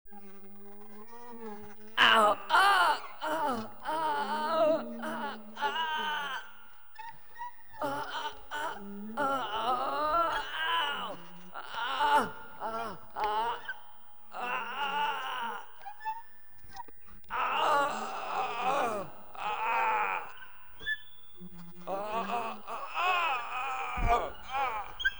Horror, Stretched On Rack; BBC Sound Effects Library

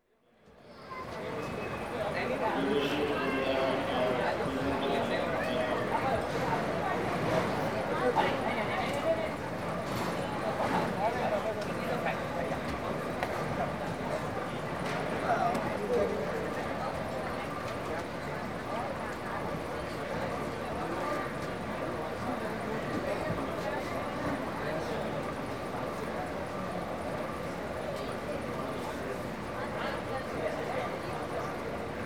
India Transport - Deccan Train; India & Nepal-City Life; BBC Sound Effects Library

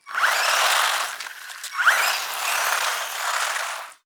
RC-Car Driving, Reely Core Buggy; SoundBits

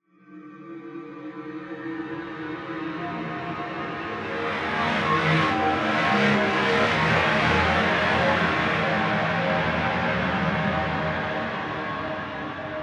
Atmosphere; 99Sounds; InterSpace

